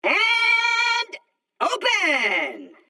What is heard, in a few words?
Clap Trap